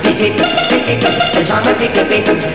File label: beep beep